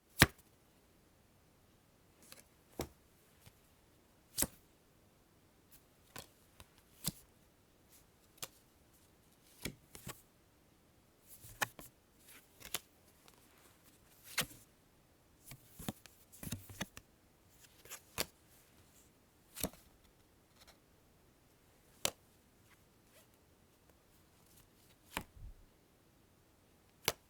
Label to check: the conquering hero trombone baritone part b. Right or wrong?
Wrong